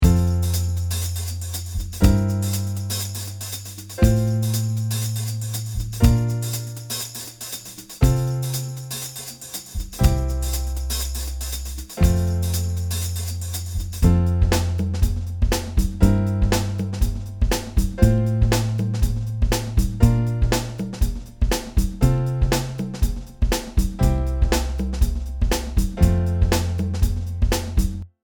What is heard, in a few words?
Accomp